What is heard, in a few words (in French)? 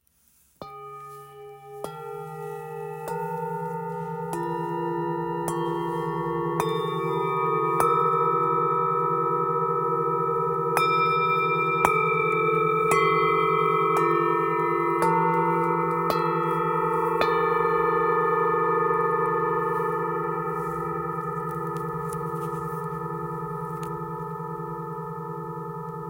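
Set fleur de vie, bols chantants tibétains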